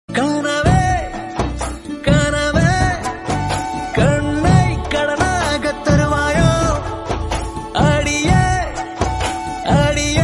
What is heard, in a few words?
tamil new mobile ringtones ringtone